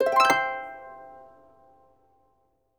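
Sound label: harp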